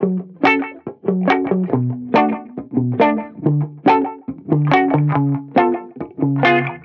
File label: Reggae Guitar Loop 140 BPM F# Maj; Vibrations; Cymatics; Vibrations Guitar Sample Pack